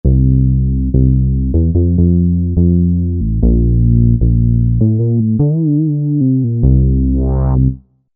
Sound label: Smooth Bass, Roland V-Synth; Factory Patches